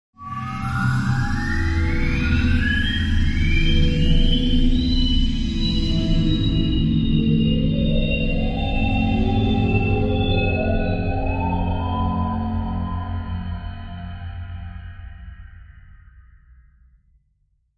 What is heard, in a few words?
Bed High Pitch Phanto